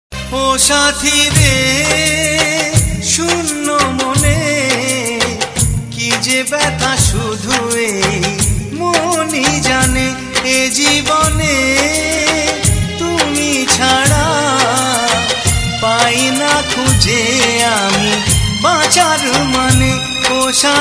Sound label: bangla sad ringtone